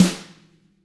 TAMA SNR HIT; ILIO; Double Platinum Drums 1

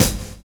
OPEN FIRE; Univers Sons - Kitch 70s

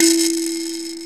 SANZA 3 E3; AKAI S-Series CD-ROM Sound Library VOL-5

